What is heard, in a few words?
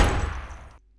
Inf Weapons; Red Orchestra 41-45